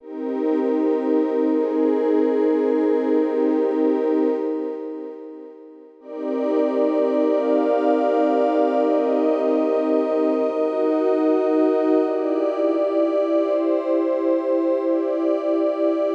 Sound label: Underwater Groove